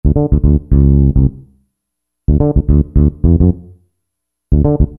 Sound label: Sustainer bass